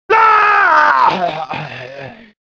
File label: noob scream; armageddon